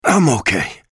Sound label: pain ability damage; bj blazkowicz; low health